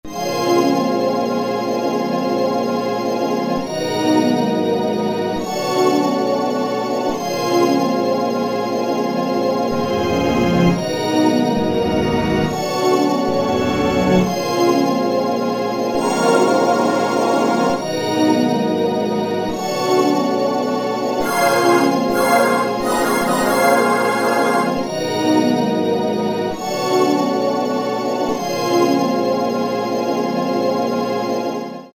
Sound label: Pad, E-mu Proteus VX; Pad Life